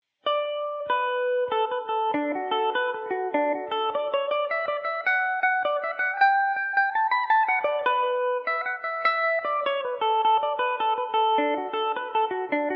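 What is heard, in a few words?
D major revelation, Irish mandolin; bank of turf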